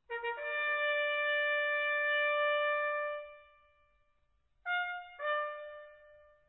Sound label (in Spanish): escuadra, corneta